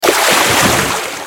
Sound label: water splash; skill; sk dragonhead